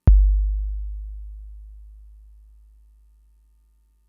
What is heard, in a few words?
Kick, Drum Machines Fricke MFB-522